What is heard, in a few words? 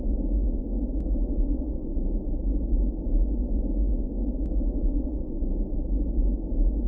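Engine Drone Interior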